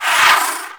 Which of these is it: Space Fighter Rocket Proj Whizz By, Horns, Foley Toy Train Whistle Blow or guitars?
Space Fighter Rocket Proj Whizz By